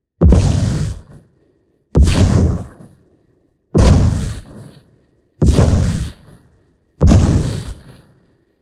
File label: Magic Fire Impact